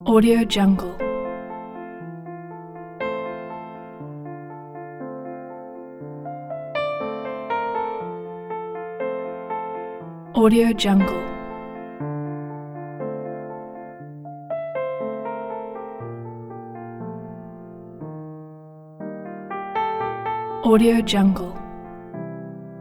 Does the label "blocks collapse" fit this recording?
No